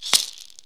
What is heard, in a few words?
MARACAS; Zero-G Ambient 1; MISC SHAKERS